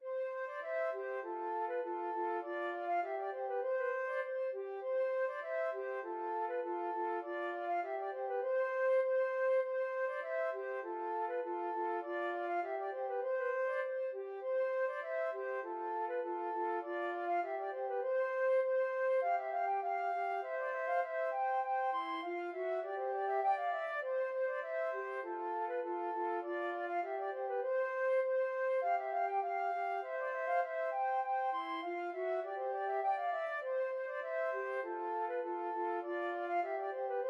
la rose flute duet

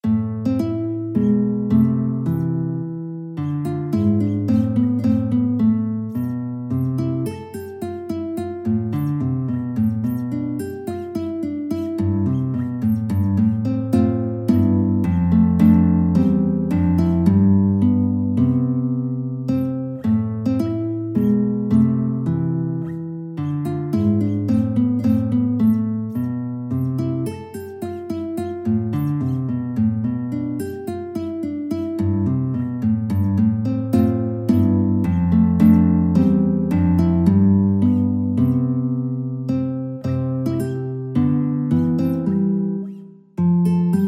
Aria guitar; Brescianello

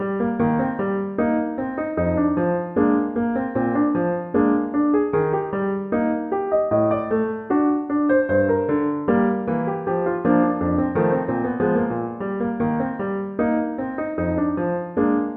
hours, piano

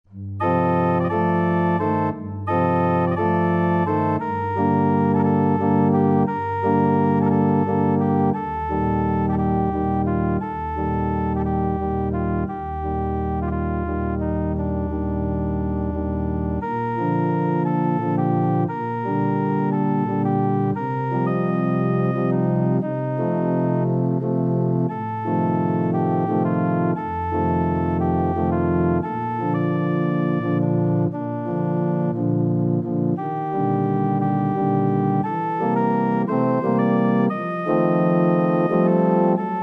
songs my mother taught, organ

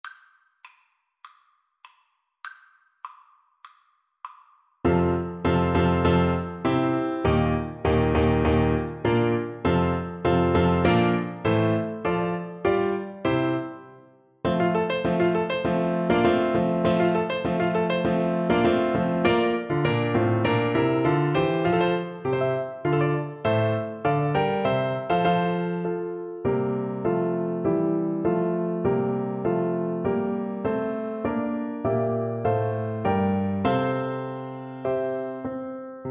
bruch scottish fantasy FL kar, Flute

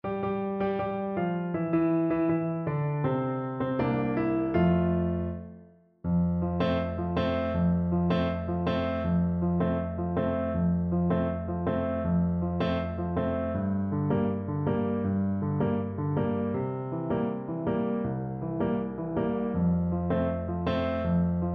Clarinet

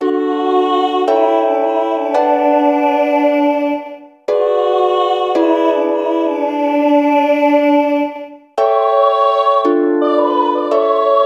Uke, ukulele

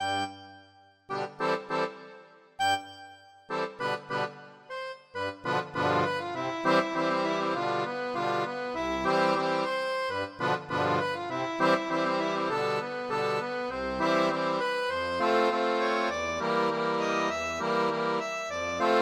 accordion, pizzicato polka

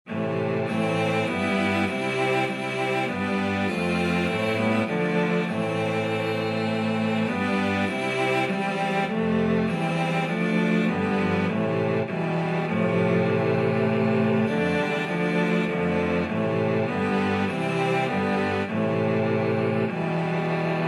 cello quartet

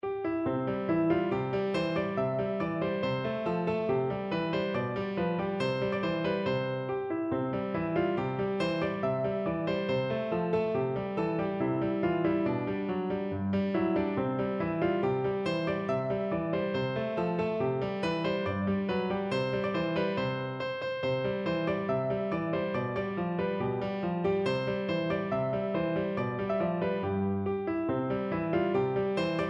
the irish rover piano

